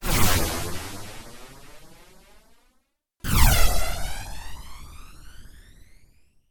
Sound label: Small Fast Turning Whooshes